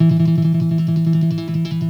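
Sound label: classical pick, guitar; Greytsounds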